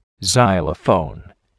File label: xylophone